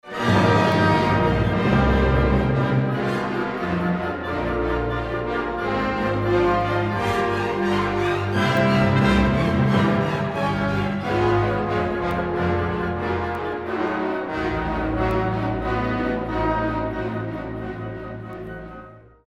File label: Trombones